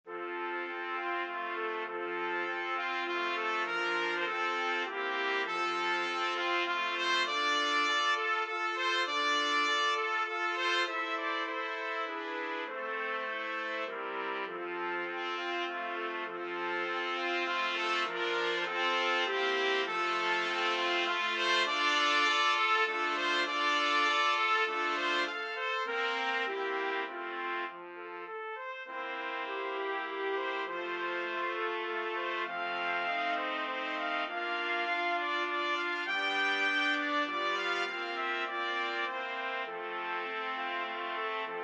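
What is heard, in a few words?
brahms waltz, 4 trumpets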